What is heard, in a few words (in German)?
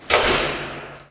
tür